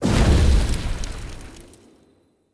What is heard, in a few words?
Short Sword Fire Blade Impact; Weapons; Elemental